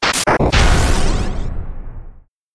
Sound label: impact